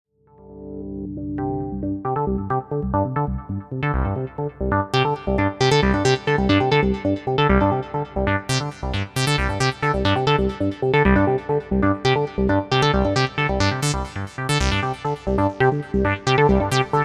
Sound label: Auto Panned and Delayed Arpeggio